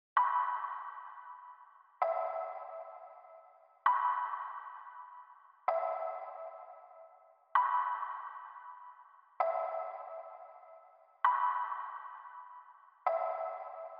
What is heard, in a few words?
Reverse Melody Loop 130 BPM E Min Percussion; Loop Stems; Cymatics; Oracle Sample Pack